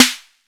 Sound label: SD, korg drums; Korg Electribe; ESX-1; electribrary-3